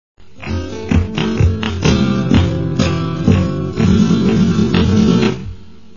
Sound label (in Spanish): buleria SLOW